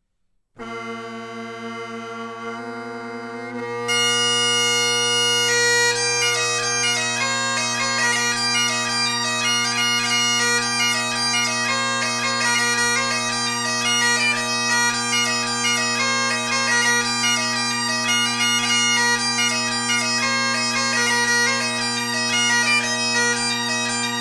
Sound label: jig